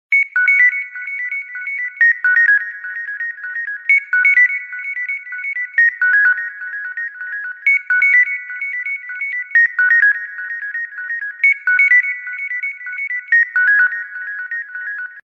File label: Xiaomi Pad 6